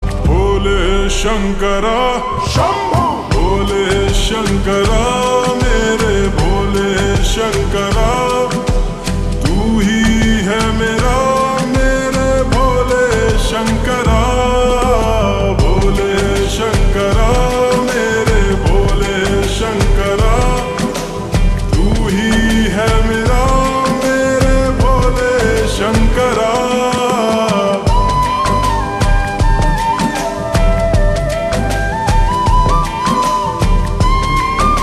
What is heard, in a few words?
Slow Reverb Version Ringtone